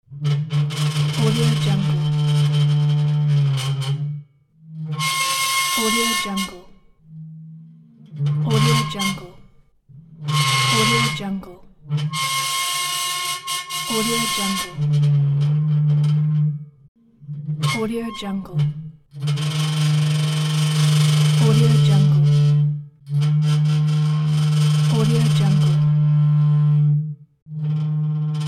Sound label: rusty metal creak